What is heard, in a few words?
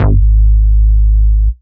Bass, Root G#; Vengeance Samples